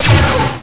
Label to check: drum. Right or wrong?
Wrong